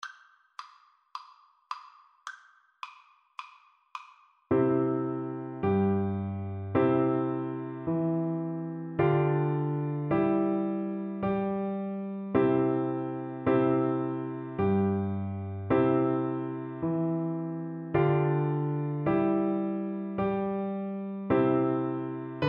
deck the halls, oboe

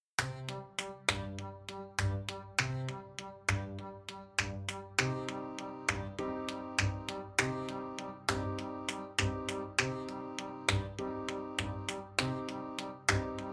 secretx trumpet

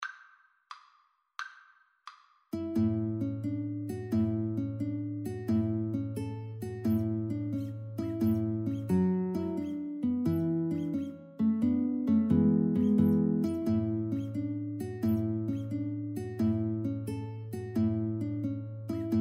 Guitar Duet; carulli op120

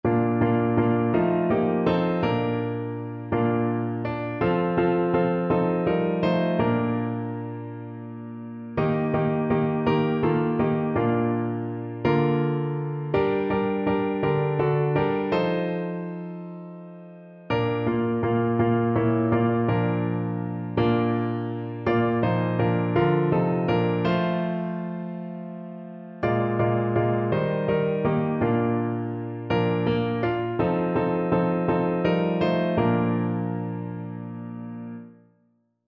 showers of blessing, piano

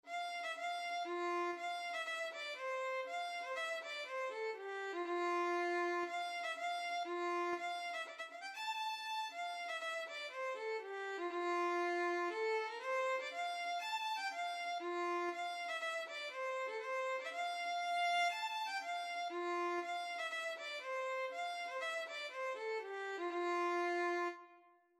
violin

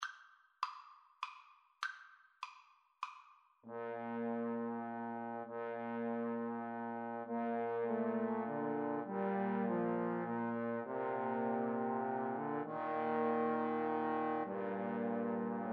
Trombone Trio; saint saens swan

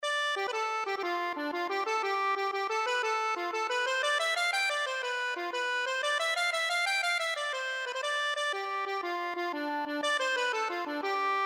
accordion

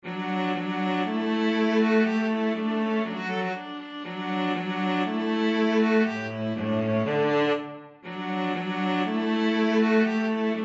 viola cello duet

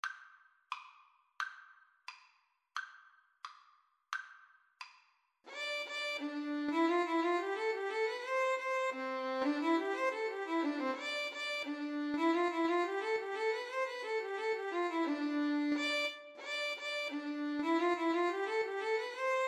violin cello, Violin-Cello Duet; the auld grey cat

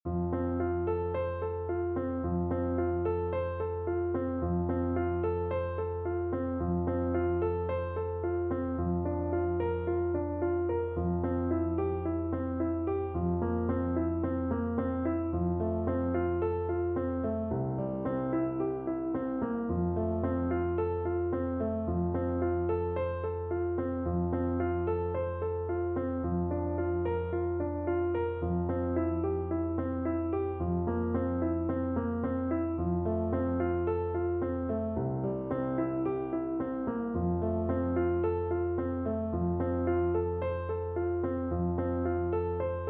duermete mi nino, Tuba

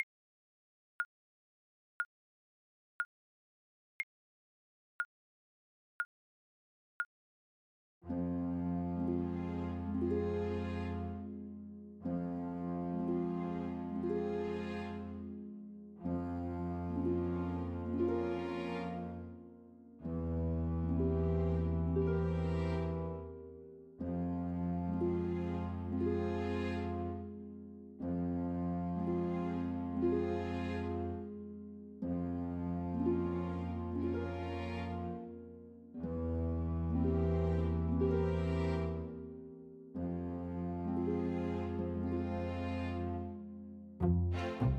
czardasz, Trombone

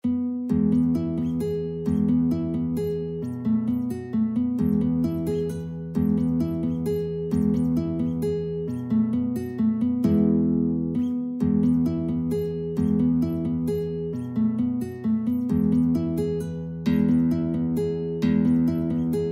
waltz, guitar; facile dorn

